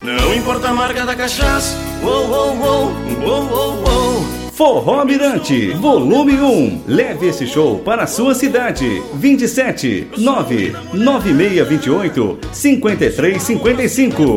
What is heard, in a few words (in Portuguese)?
forro, telefone